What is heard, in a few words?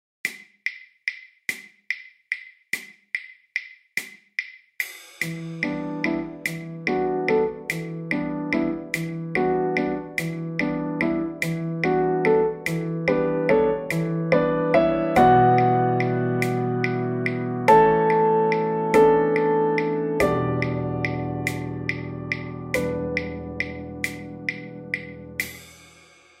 Counting in Threes Example With Beat